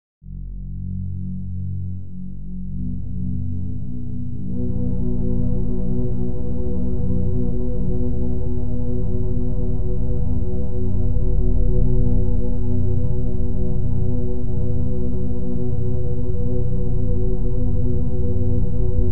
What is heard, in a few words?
morse code